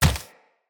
Root Spider Mother Step Dirt, Spiders

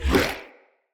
Corrupted Sproutfolk Attack Short, Enemy, Vocals; Characters

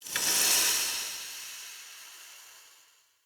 Smithy Steam Burst; Ambience; Soul Plane

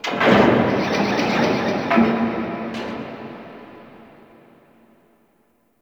Mid Mech; Guild; Warframe; Misc Remaster

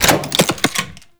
Hack Minigame Quit; Warframe; Duviri

